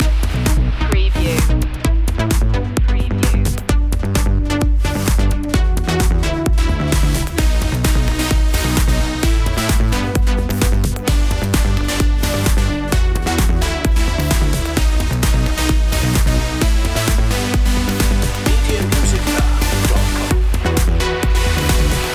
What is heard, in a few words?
summer trance loop